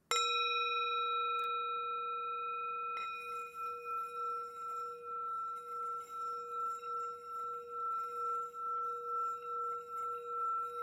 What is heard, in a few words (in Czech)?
tibetska misa